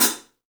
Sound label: 3D Drums, TIGHT ZILDJ; 3D Sound